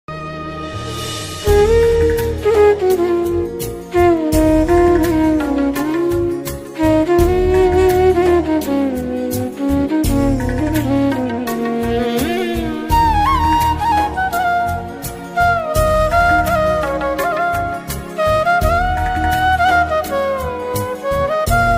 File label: flute version ringtone; veer zaar